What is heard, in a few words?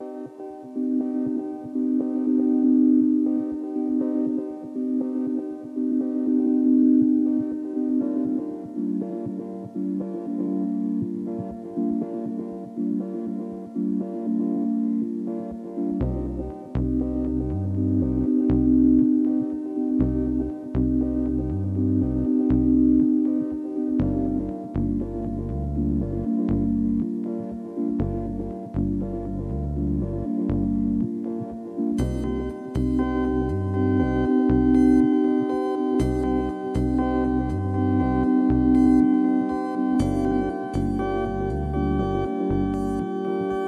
ambient meditation AMBIENT RELAX